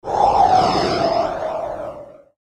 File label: airy smooth phase